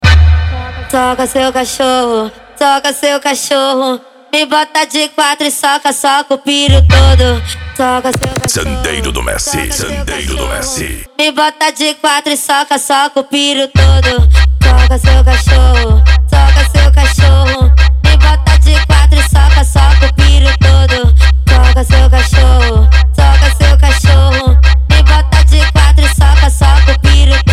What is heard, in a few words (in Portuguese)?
funk mega bass